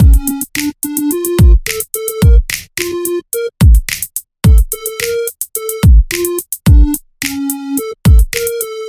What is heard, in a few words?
Krump Beat